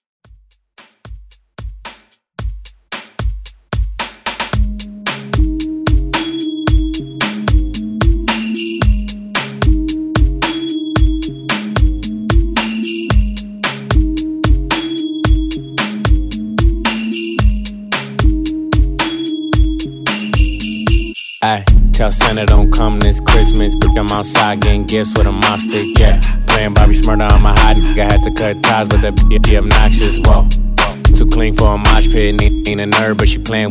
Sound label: Xmas Redrum